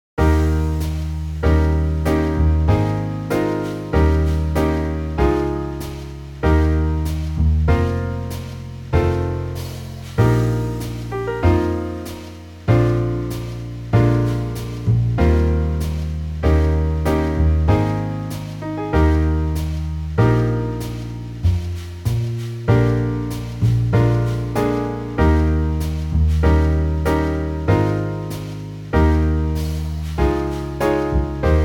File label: Eb Slurs, brass